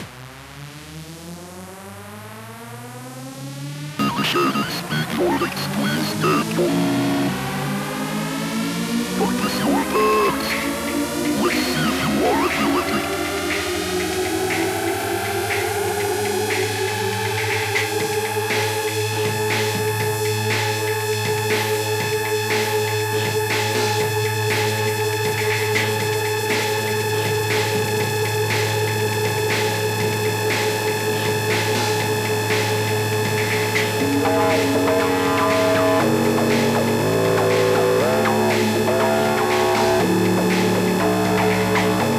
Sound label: funny DNB engage; Polynomial 2 Universe of the Music